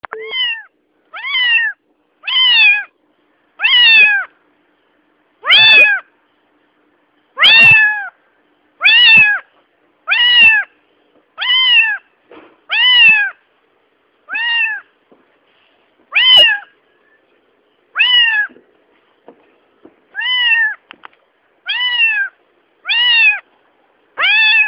Gato Miando Alto

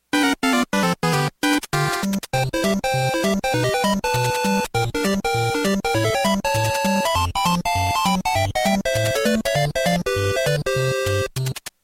Engraçados games

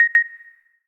Huawei P40 Pro Mensagem

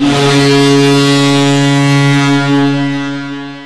Buzina Alta de Navio

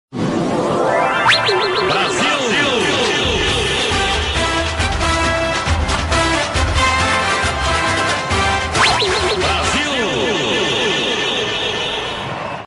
Jingle Goal BRASIL SIL SIL

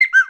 Assobio Notificação

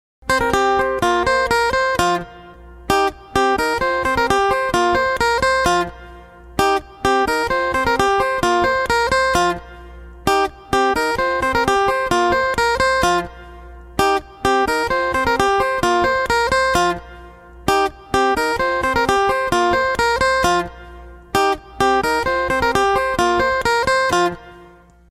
iPhone Original Guitarra

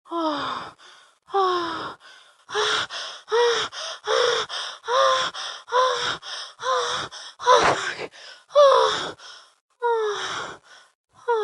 Orgasmo violento

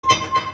Efeitos Sonoros SMS

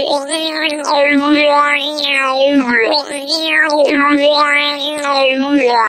Som De Vomito No Celular; toque

